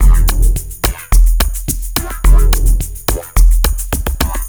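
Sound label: Pop Combo, Emaj, 107 bpm; Digidesign; Pro Tools; Pro Tools Essential Loops; Pop Rock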